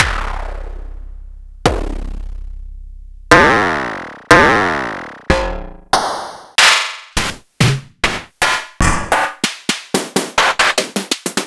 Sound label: Snare c